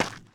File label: dirt; firefight